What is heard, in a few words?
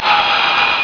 saw; KungLao